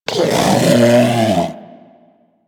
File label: Predator Vocal Alerted, Hunting Critters; Warframe